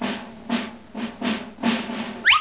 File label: HAND; 07Frog